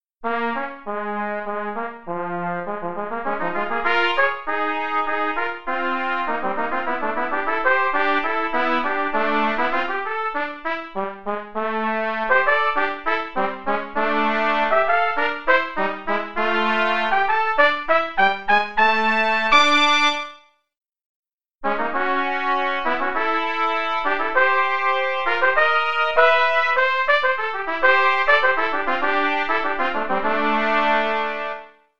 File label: jazz trumpet duet; TTN Jazz Duets; Jazz Trumpet Duets! (#3)